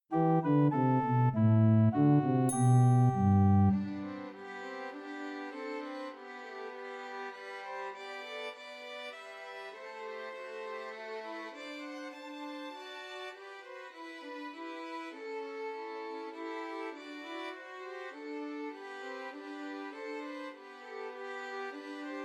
Trios for Three Violins; Away in a Manger; Christmas

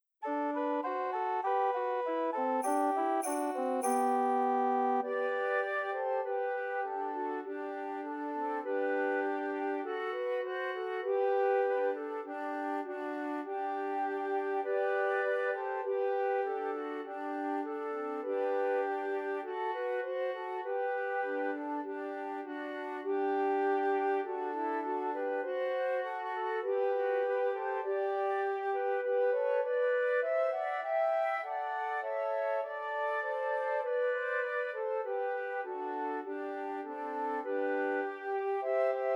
Trios for Three Descant Recorders

